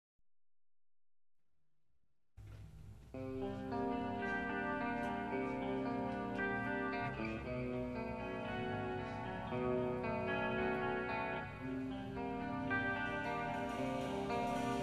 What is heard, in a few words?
rain